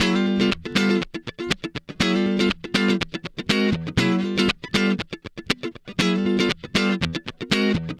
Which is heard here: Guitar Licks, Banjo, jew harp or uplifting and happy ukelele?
Guitar Licks